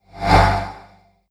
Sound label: Simple Transition